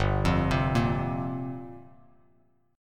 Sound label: G Minor 11th, Bass Standard; arpeggiated; 3,8,10,3; JGuitar